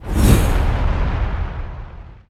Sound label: transwoosh; World Of Goo